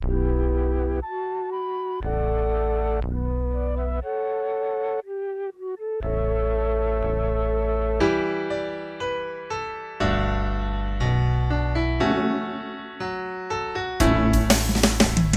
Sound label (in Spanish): INSTRUMENTAL; BLOQUES ESPAÑA VACIADA Sweden; radio3 raportajes